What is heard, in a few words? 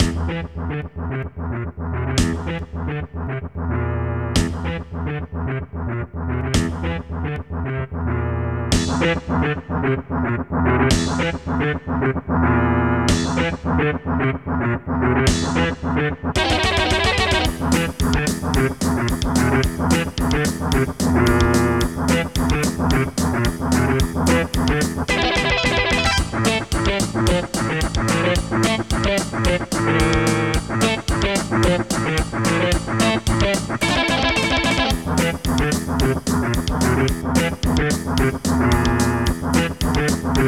AWE32 mode, DOOM; CT4390